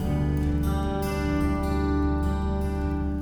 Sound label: Picking G